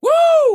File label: woo